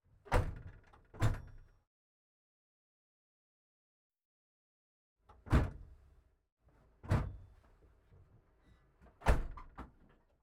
Camaro Z28 -71 onboard wild foley doors Floor Interior; 1971